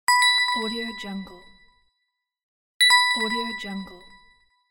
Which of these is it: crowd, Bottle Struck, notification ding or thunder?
notification ding